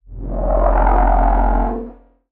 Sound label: Surge; martyn stonehouse